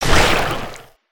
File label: Man Eater Shoot; weapons; aronwk collection; ndaudio